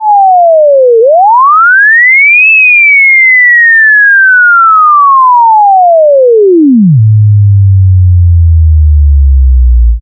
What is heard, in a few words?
whiny; nyquist; pmorales